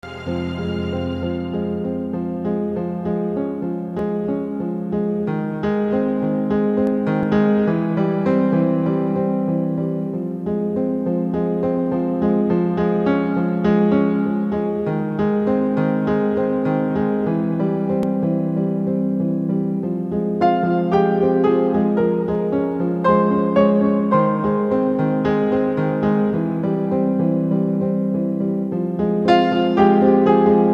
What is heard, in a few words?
Piano Solo; Soundtrack Truman Show